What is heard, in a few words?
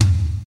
classic tom drum sample g key; tom drum samples